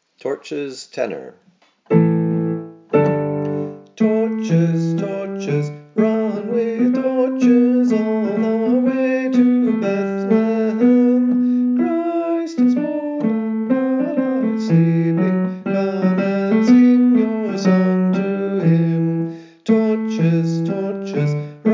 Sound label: Tenor; Joubert; Torches